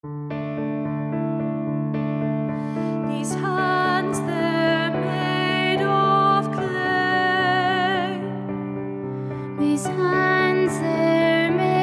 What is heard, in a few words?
female voice